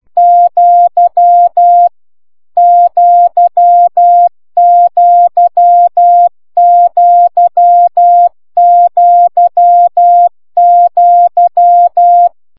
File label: cw